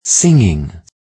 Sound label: singing